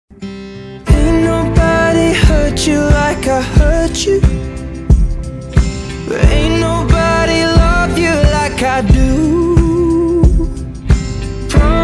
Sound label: english ringtone